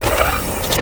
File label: Gun Draw Select Scifi, Weapons Foley; SFX Kit